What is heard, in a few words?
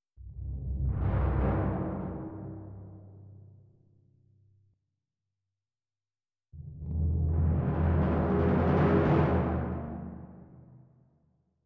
timpani; Netease